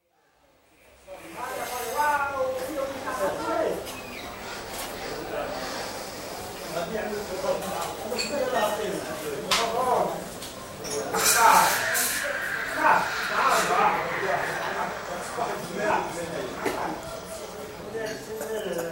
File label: Morocco: Rabat, cafe atmosphere with audible traffic, TV & expresso machine; Restaurant Sound Effects; BBC